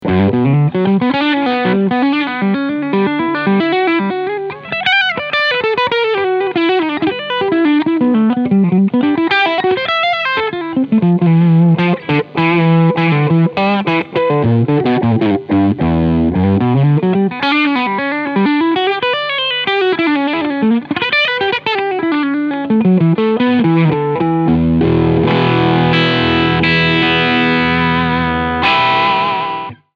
Jackson Audio Prism Trans Modus Body 9 Tone 12 Boost 12 Uhr